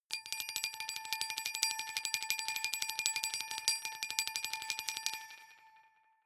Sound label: glockenspiel sparkle tremolo low